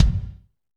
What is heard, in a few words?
KIK, Roland; Northstar - Drumscapes Roland